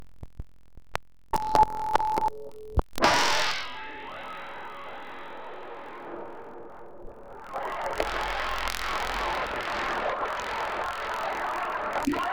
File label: exploding in an electric, glitches